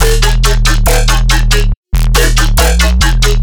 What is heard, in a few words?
bass loop 140 D#; Virtual Riot